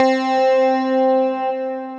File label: Gliss Guitar (Multisampled C1-C4), Placid Plucks; Ableton; Mood Reel